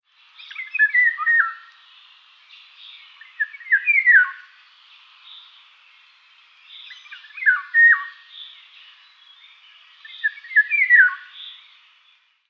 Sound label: Oriolus oriolus